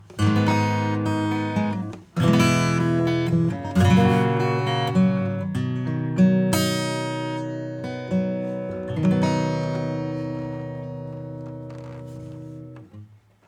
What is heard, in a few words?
Plate Reverb acoustic bypass